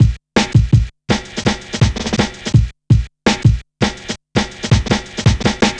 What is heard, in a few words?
Check A, Jungle, Drum Loops 165.5